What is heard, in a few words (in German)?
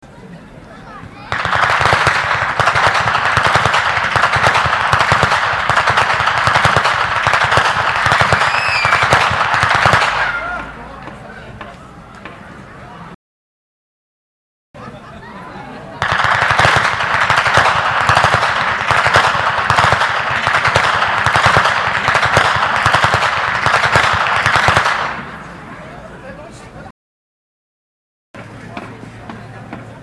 Schnalzen; Feldkirchen; Ainringer